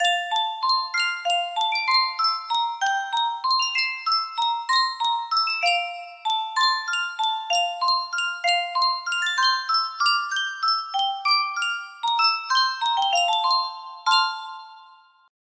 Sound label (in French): yunsheng boite a musique